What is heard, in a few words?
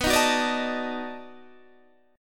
B 7th Sharp 9th, down, Guitar, Standard; strummed